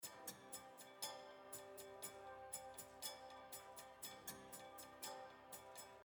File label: Rhythm